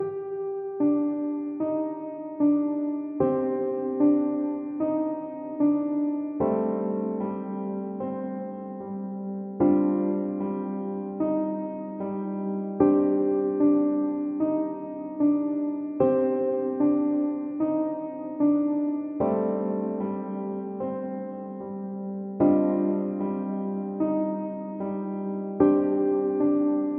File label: Spa Piano